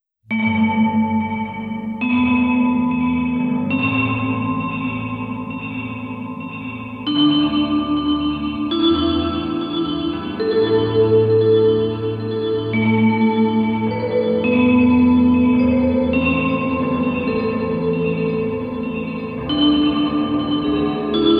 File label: Echo